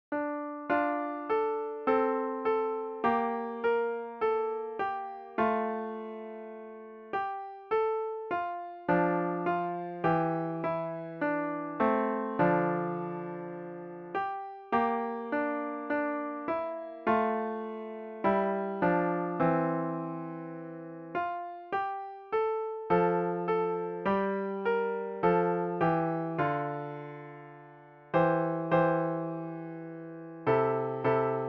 o come emmanuel piano

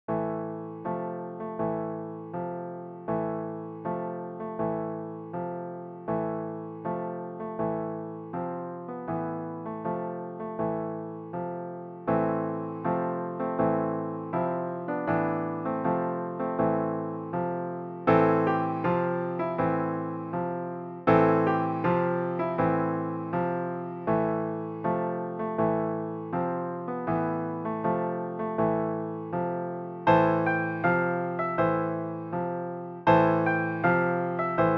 funeral march

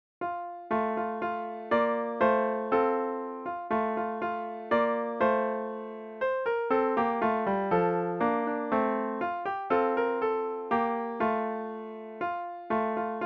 holly and the ivy piano solo

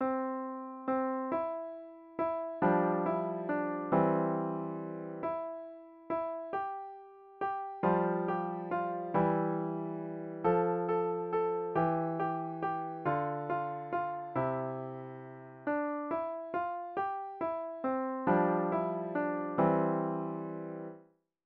piano

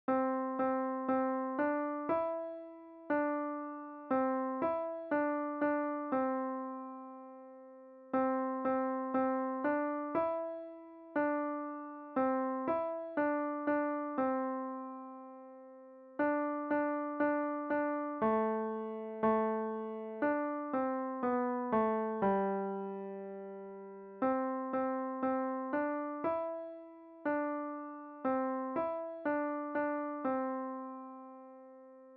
piano solo

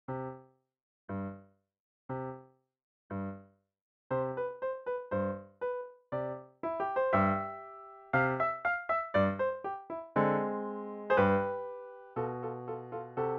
dance of the reeds piano

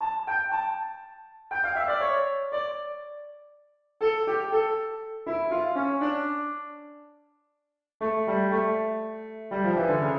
easy piano; toccata

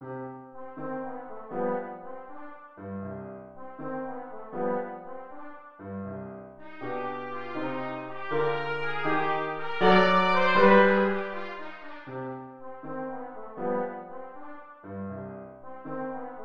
march of a marionette trumpet

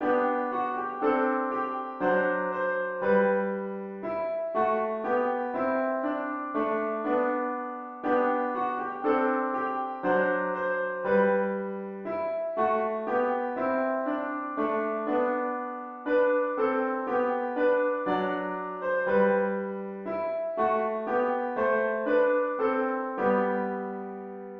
piano

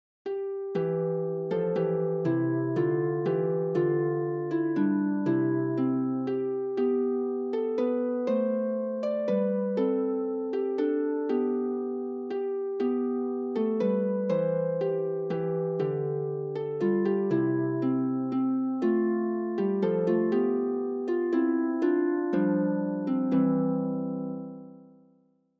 harp

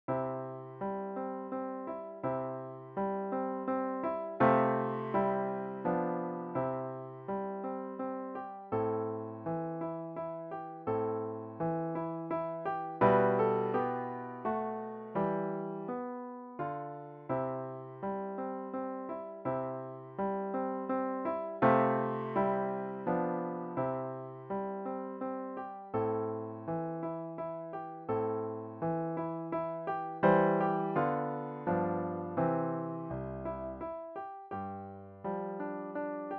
waltz piano solo

